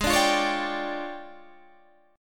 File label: D Minor Major 7th Double Flat 5th, G sharp